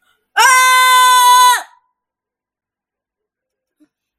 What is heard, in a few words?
error or fart